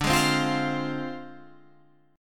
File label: F Minor 6th D